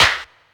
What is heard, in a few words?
clap single hit b key, Royality free hand clap sample tuned to the B note. Loudest frequency: 2676Hz; percussion single hits one shots